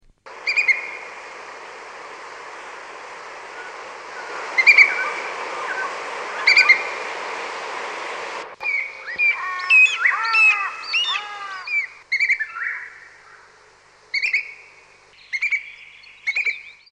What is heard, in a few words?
eagle little, bird calls